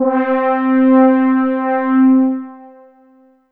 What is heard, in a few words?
Yamaha EX5 Oberweich C4